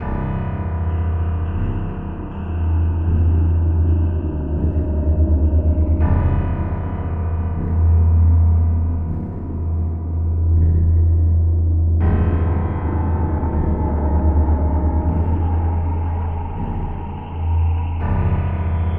Escape Tension Track; Valley; Reactor

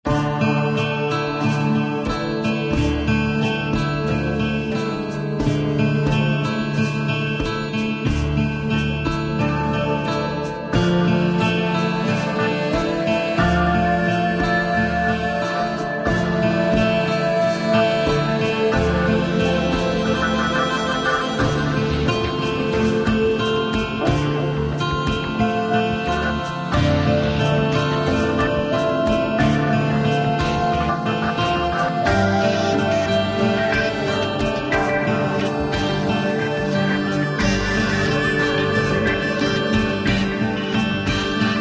Loop Neutral; Tales from the Borderlands

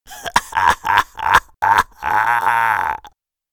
Hehe, Voices; freaky clown town mystery windows 2020

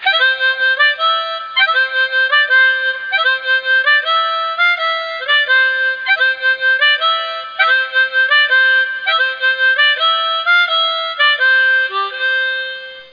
Harmonica; freddi fish 4 the case of the hogfish rustlers of briny gulch

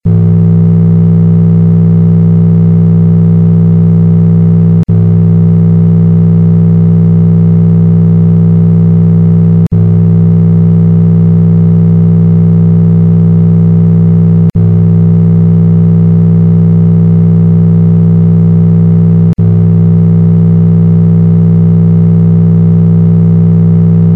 Buzz; Fleshchild; windows 2012

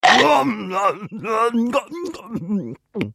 Kingpig Chew; bad piggies; android; mobile; windows

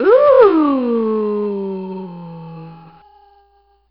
Ooh; Tempest 4000

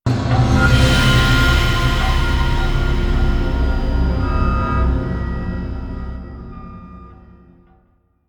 UI Stinger Complete; Starcraft 2 Wings of Liberty

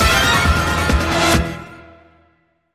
Warning; tokyo crash mobs; nintendo 3ds

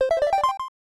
Unused Jingle

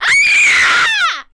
Female Scream

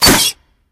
Metal Pipe Damaged; Angry Birds

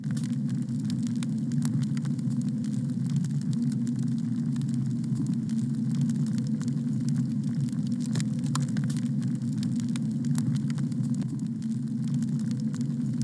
Fire Room Ambiance; I Spy Spooky Mansion Deluxe

